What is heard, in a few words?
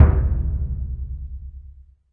big burundi, African Burundi Drums